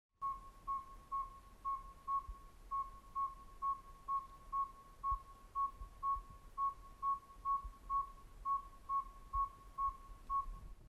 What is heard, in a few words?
Saw Whet April First Night; Cherokee